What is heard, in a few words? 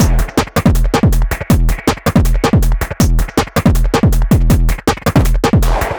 Clipped Cutz 160 BPM